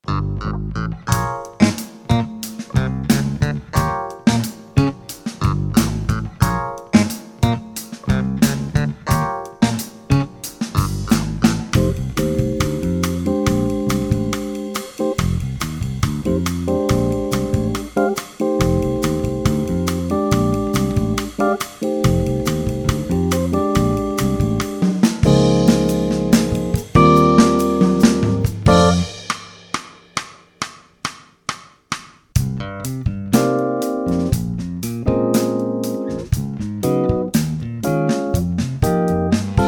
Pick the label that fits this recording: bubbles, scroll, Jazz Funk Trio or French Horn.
Jazz Funk Trio